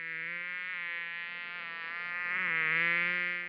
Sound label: fly buzzing